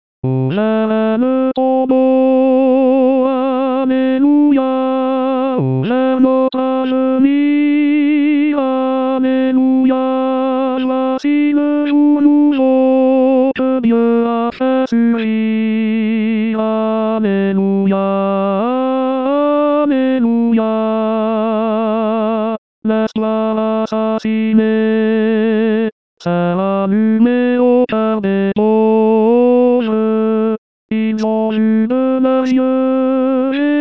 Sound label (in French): tenor, chorale; Ouvert est le tombeau